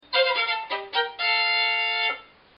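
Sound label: level up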